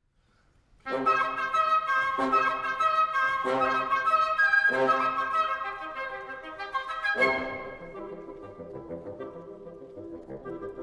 Wind Quintet, Allegro con spirito; 6 Bagatelles

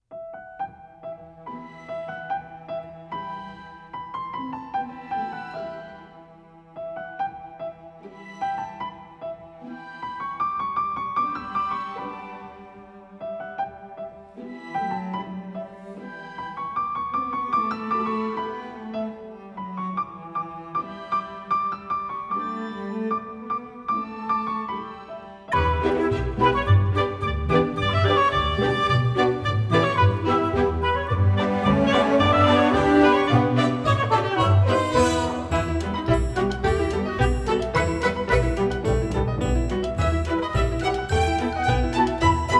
Orchestra; London Philharmonic; Angry Birds

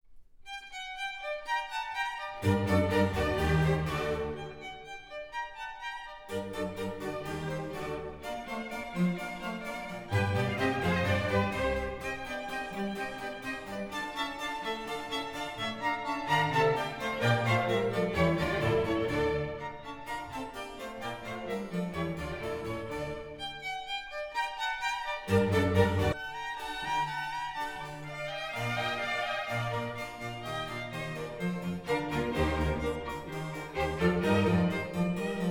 Symphony Orchestra, Concerto Grosso in G Minor

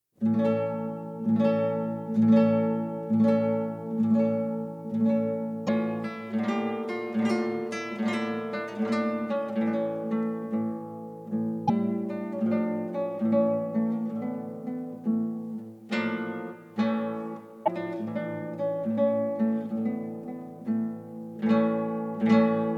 Preludes for Guitar in D Minor

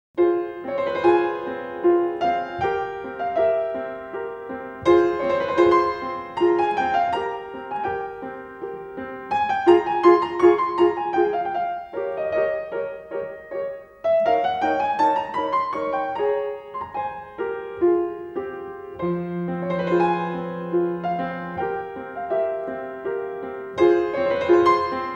Polonaise in F minor piano; Serieuse

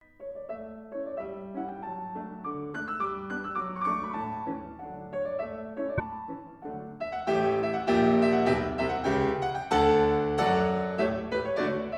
Ecossaise in D dur; Franz Schubert

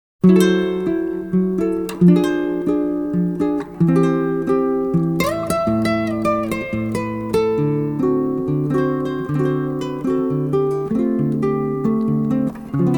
Samba

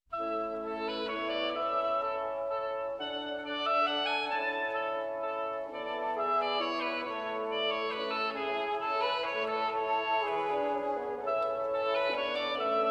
Orchestra Minuet in G Instrumental

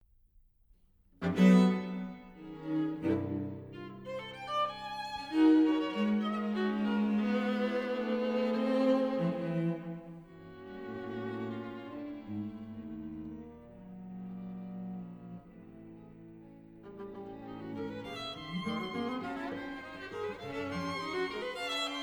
Lyric Suite for String Quartet

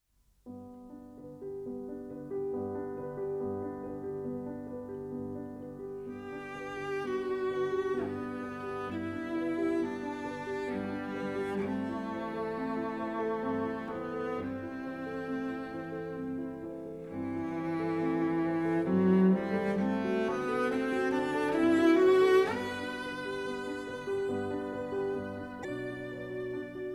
Arr for Cello 2 Pianos; The Carnival of the Animals; Le cygne

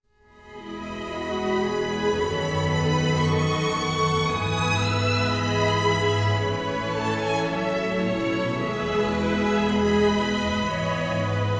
Polish Lullaby

